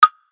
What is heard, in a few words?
low, woodblock; chrome music lab